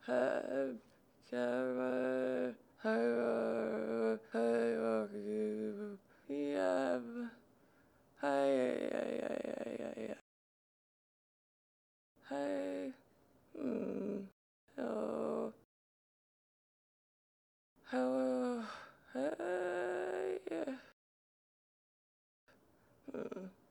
pilgrim old female idle loop; Hollow Knight: Silksong

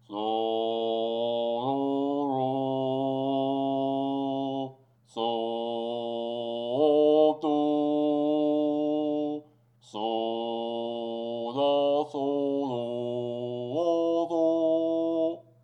coral judge sing type words

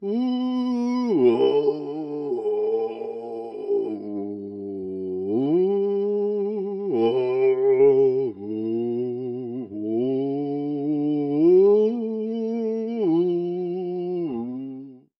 Dock Worker Sing; Hollow Knight: Silksong

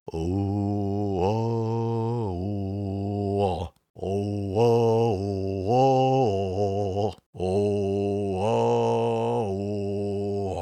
NPC Sing; Hollow Knight: Silksong

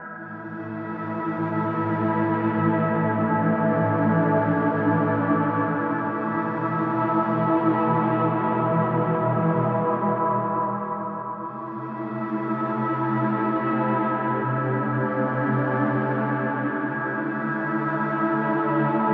Ambient Silk

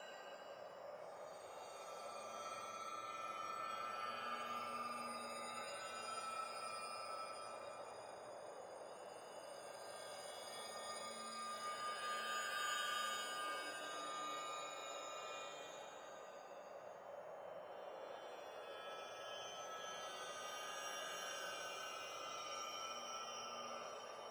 atmos peak interior crystal; Hollow Knight: Silksong

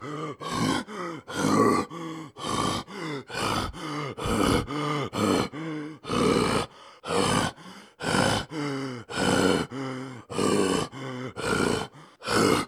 work loop

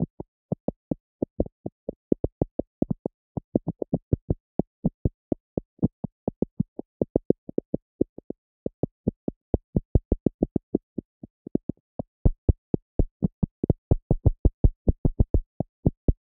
needolin general vibration

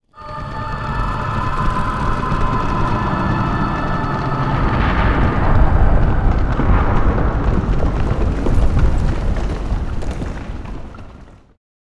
abyss quake; Hollow Knight: Silksong

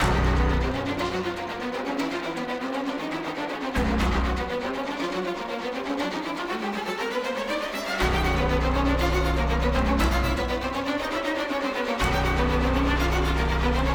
Creepy

